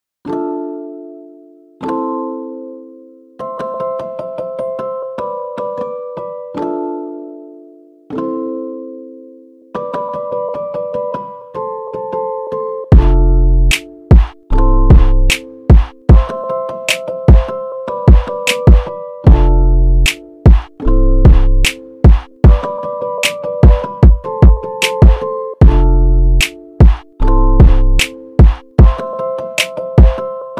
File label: instrumental ringtone